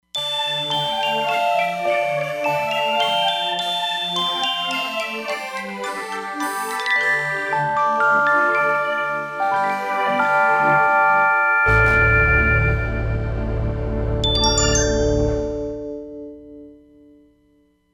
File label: Roland Fantom XR; Fairy Factor